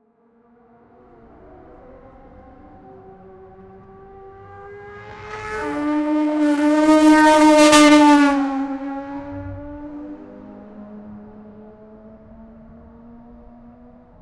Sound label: racing cars rev up at; race start